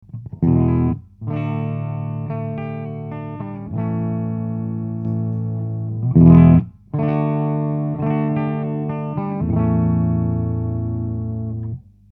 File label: middle, distortion, MagicDrive